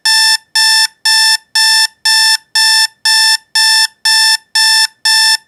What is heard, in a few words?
notify, alarm